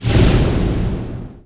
Magic Missile Fire; Idle Champions of the Forgotten Realms

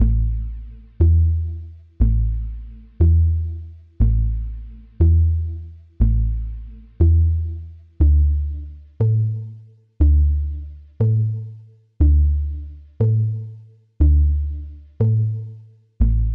slow bass

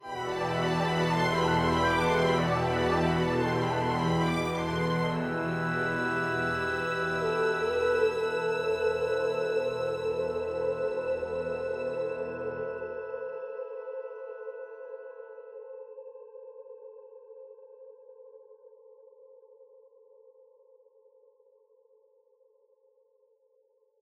Music Layer

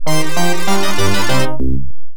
Jingle, pinball; Gorbie Jingle; Taxi Williams pinball

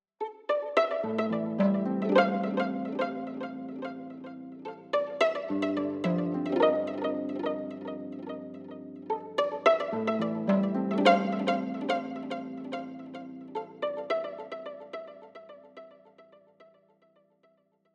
bugcity creepy head 108bpm Amin 26beats

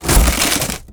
environment wood falling start

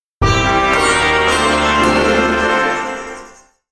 Fighting Draw

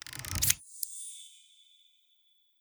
salaries open

